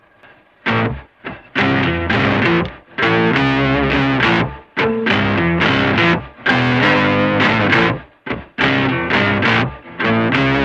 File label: blues